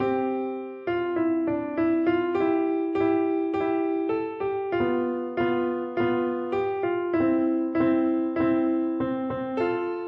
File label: atirei o pau ao gato, piano